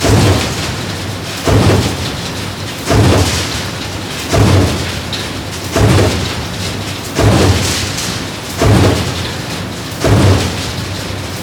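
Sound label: ambience industrial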